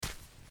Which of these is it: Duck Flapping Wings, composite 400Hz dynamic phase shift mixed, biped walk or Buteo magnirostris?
biped walk